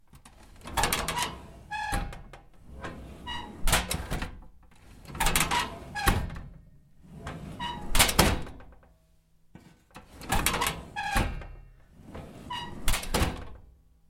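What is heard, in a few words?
opening and closing metal waste basket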